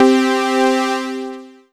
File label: Casio VZ-10M Power Fifths C4